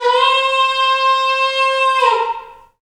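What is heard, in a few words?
Wind Bullhorn, Afro Horns; Roland LCDP11 Africa VOL-1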